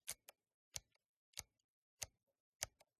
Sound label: Camera Film 35mm SLR Shutter Speed Dial; PRACTIKA DTL3; MKH8040; PMSFX